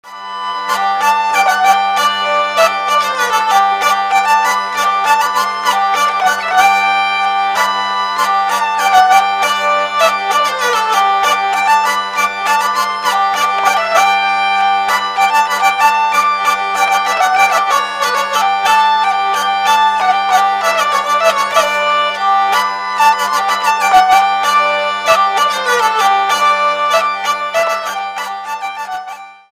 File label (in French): menuet; St Cezaire